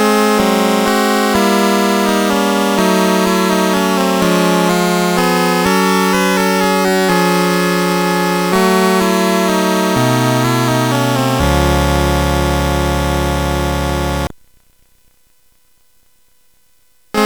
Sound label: Mini Melodies, SID CSG8580R5